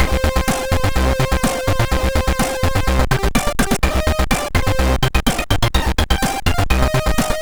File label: MOS6581; Quickie1